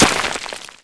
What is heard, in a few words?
ric, weapons; valve